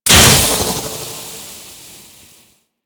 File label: Archwing movement malfunction; Warframe